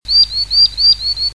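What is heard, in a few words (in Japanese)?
kawasemi, kingfisher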